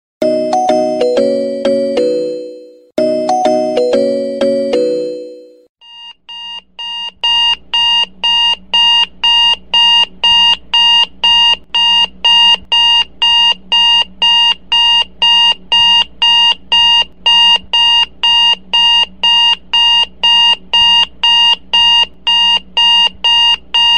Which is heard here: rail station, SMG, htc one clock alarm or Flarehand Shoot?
htc one clock alarm